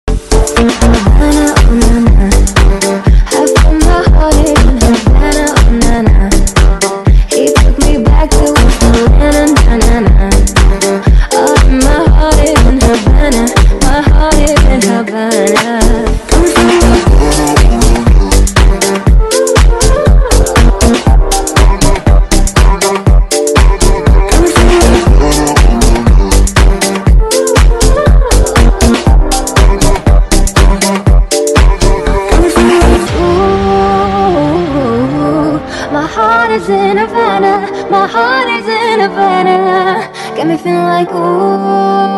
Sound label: Mobile Ringtone